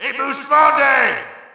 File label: Shout, audience; UFC Tapout 2